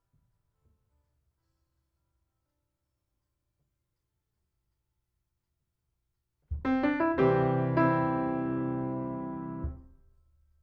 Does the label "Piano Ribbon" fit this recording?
Yes